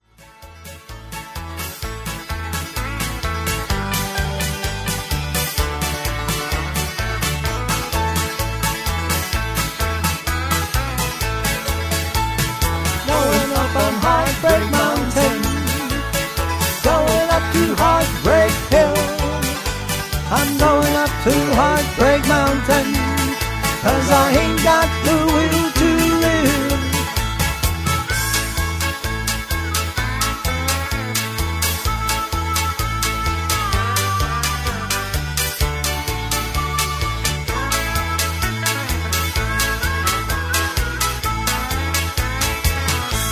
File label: Instrumental with Harmony; Singing Calls